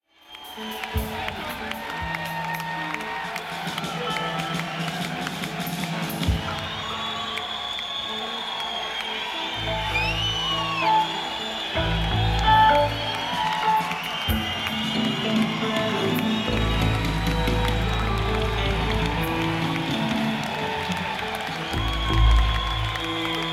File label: Crowd Tuning; senn421